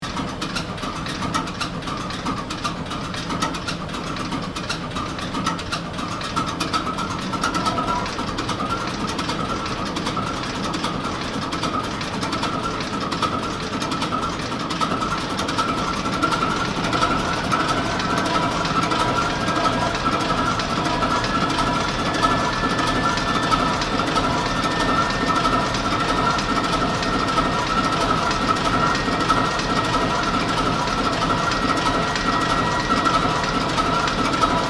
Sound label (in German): Deutz Motor